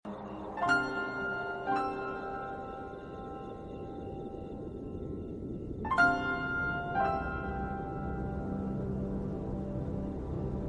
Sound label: instrument ringtone